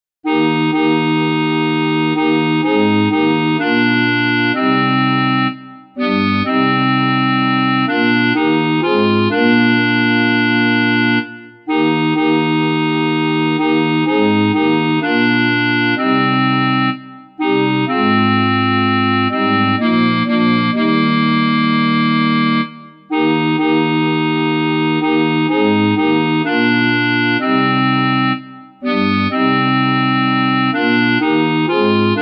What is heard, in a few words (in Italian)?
CONTRALTI; Veglia di preghiera - Santa Cecilia 2022